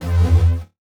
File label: Multimedia Internet CD-Rom Flash Short